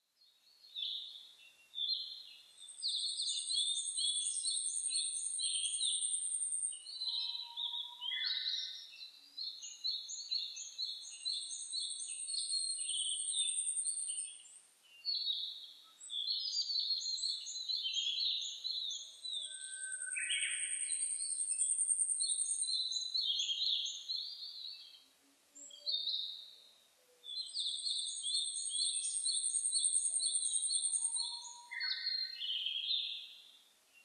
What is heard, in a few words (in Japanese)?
yabusame